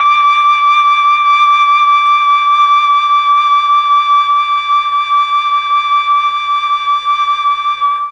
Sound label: D4 mellotron FLUTE